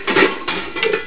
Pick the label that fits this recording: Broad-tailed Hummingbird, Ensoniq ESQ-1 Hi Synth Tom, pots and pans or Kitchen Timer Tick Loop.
pots and pans